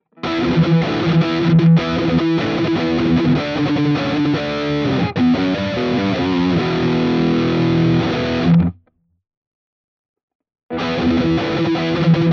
ESP LTD TE 1000 Rhythm

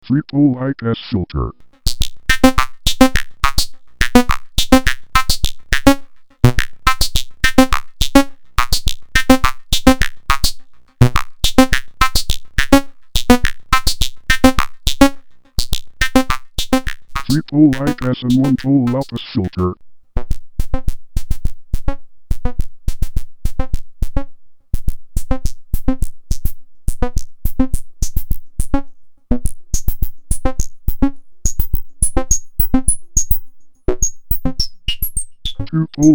Filter Types

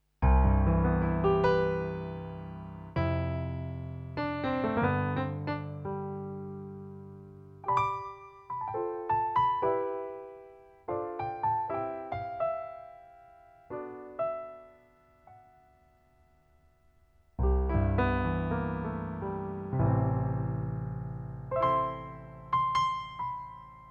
Grand Piano; Coda Pro

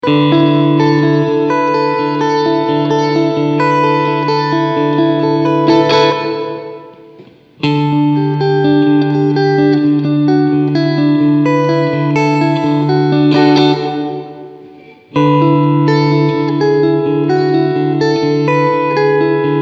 Medium Decay

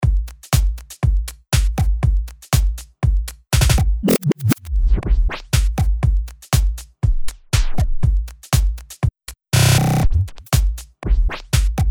LoopMash FX1

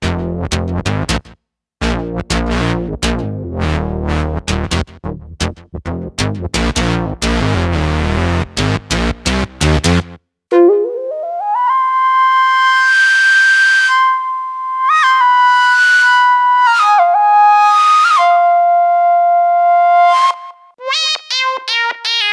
Akai EWI4000s; Patchman Soundset Volume 1

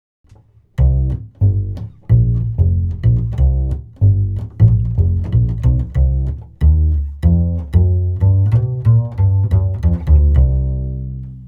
UT fet 47 Double Bass 10 cm bridge

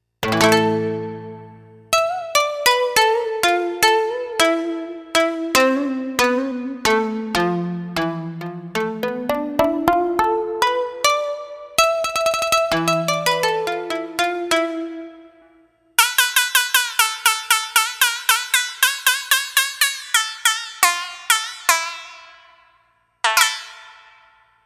MODX Koto Shamisen FM

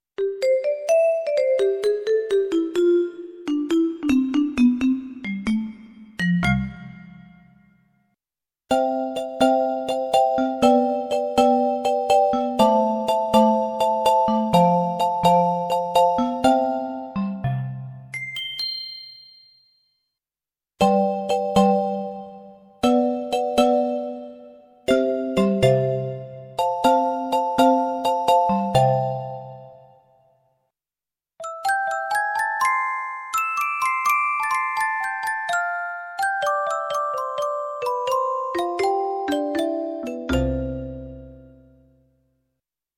Mallet

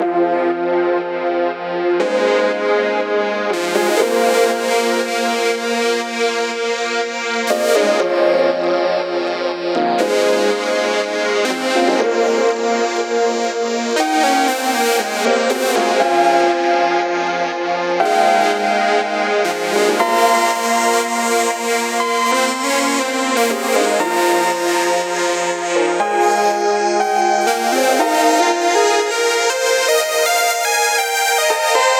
Lead Bus presense

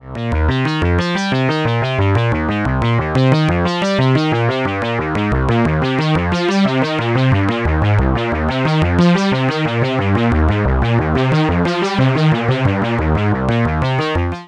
Unisono

